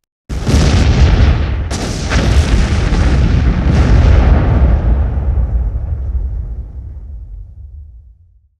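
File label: war cannon bomb explosion; kino i videoigri vojni pushka bomba vzriv speceffekti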